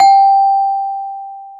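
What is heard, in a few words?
CELESTA G5, percussion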